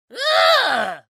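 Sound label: sounds of goblin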